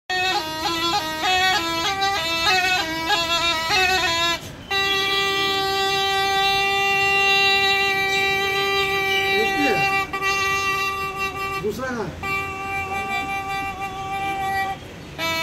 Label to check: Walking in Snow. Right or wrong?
Wrong